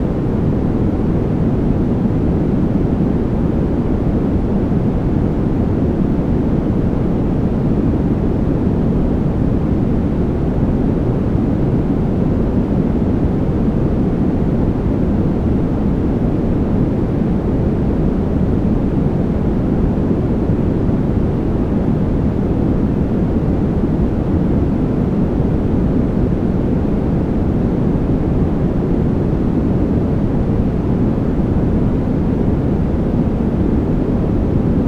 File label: Clean Baby Sleep White Noise Loopable; Dream Supplier